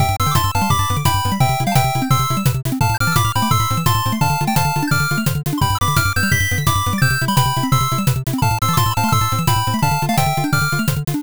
bgm drive

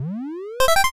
cabinet boot

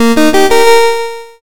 start

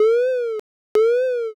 warning light

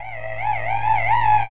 swarm approach

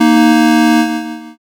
buzzer end

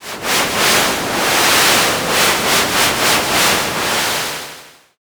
river

